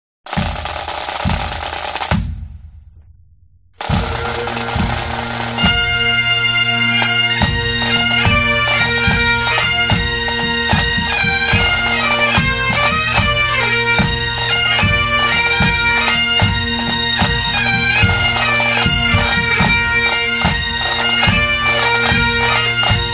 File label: Kilted Music; HIGHLANDER